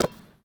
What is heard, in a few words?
Street Ball Catch; SFX Kit